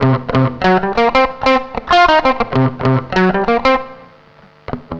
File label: CLEAN, CLEAN GTR